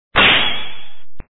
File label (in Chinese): 架刀; Samurai vs Ninjas